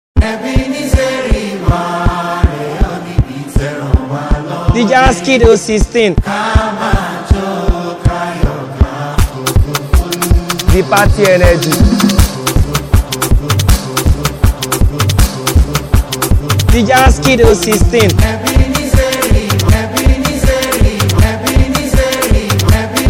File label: Energy Beat